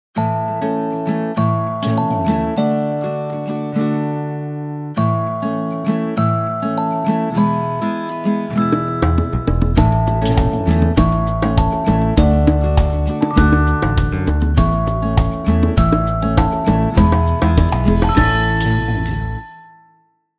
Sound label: Guitar Bells Kids